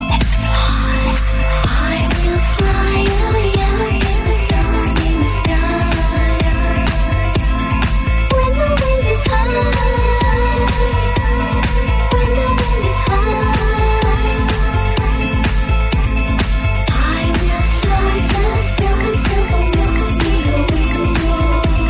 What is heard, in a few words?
vocal deep house